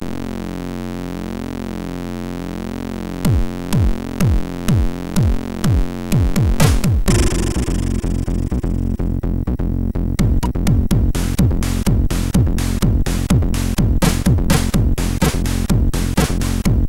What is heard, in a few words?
Loading sid MOS6581; hvsc